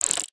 Broombot Sm Whirl, creatures mechanical; Inventor Broombot

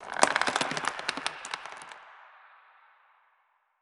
bridge creak; gnarled forest

